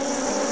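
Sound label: mosquito; REDNECK; RAMPAGER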